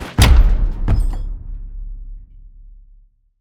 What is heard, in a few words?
case drop; ui